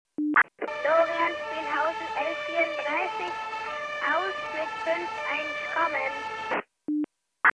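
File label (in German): Feuerwehr AUS, Feuerwehrfunk; Spielhausen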